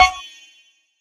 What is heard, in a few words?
E-Perc Blip Charred, Electronic Percussion, Drums; Ableton Live 12 Lite; Core Library